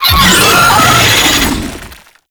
Proto Infested Brachiolyst Attack, Vocals, Enemies; Warframe